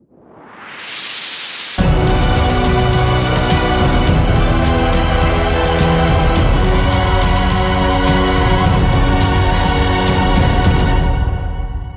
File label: Cathedral Fugue